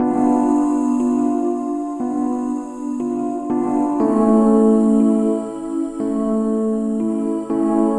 120 Pump; maudio; Hydrosonix

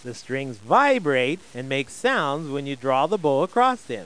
string; My Science Fair

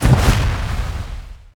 rain

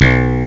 Slap Bass

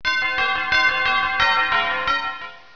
PEAL

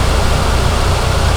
vent; Fx; willofsteel

